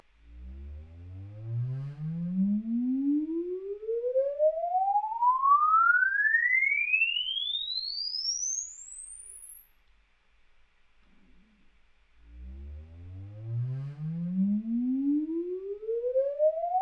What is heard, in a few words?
Sweep Recorded